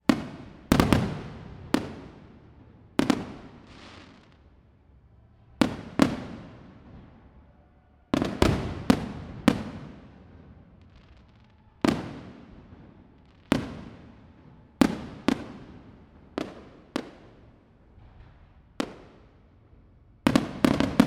Fireworks

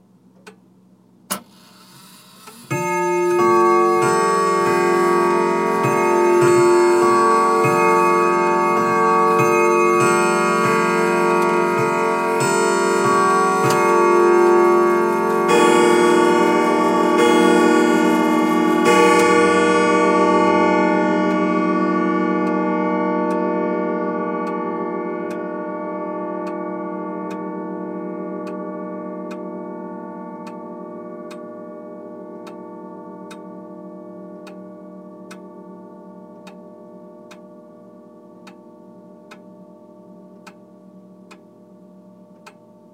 Grandfather Clock Chime

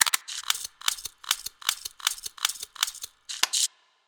Shotgun Reload

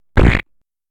Fart

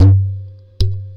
Djembe cheap mic session

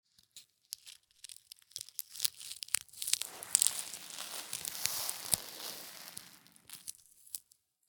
Peeling Paint

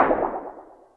Cartoon Boing

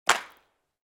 Clap

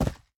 Steps Dirt

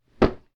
Foley Knee Impact

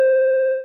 Ping

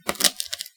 Egg Crack

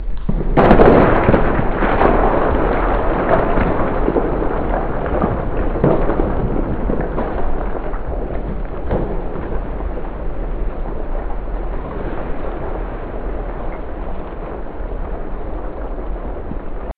Lightning into thunder

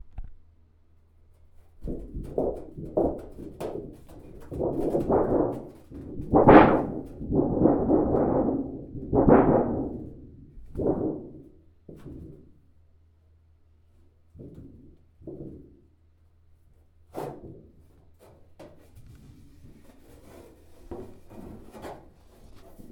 Sheet Metal Shake And Drag

